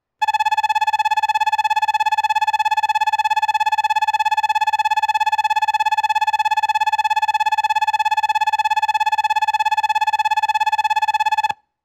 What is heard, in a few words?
dep bell; tokushima st; jrshikoku